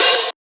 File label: Old Skool House Open Hat